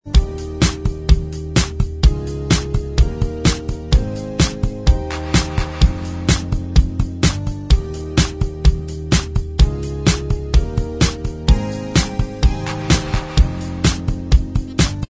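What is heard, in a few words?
instrumental, backing tracks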